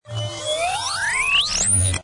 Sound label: shoot charge; Danger Mouse - Ultimate